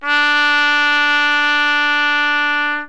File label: bach trumpet d4; Horns and Brass